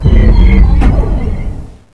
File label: seal end; wizardwars; spells